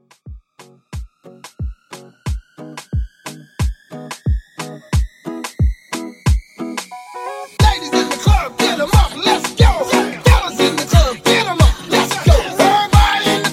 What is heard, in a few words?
Hype, 90